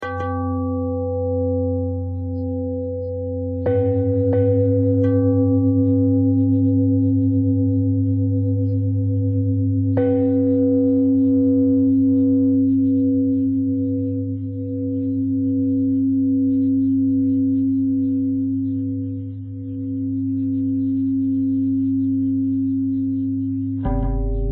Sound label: bell threads